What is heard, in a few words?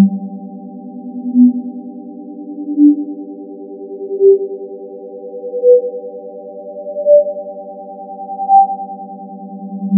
arpeggio